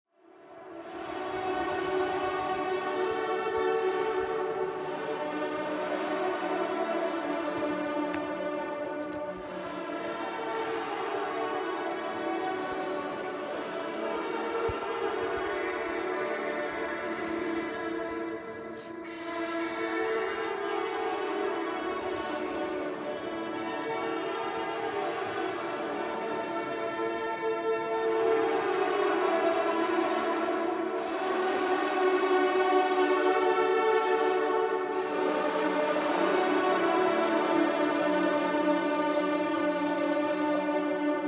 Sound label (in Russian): muzyka katolicheskoi cerkvi